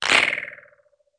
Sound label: bumps; SFX dw bumps3mod